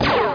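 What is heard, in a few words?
blast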